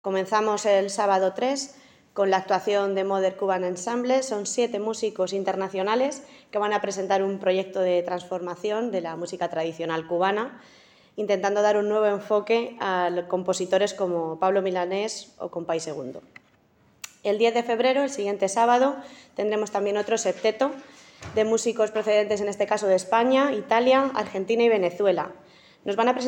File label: Jazz